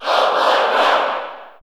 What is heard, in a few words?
Cheer English; Roy Koopa; SSB4; SSBU